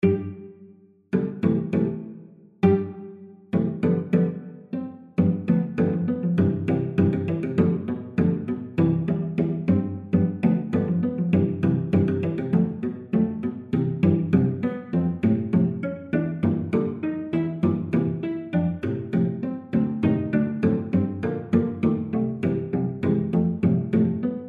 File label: pizzicato polka, cello quartet